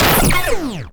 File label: missile